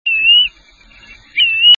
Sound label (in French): Pluvier Argente; Charadriides; Le Pluvier argenté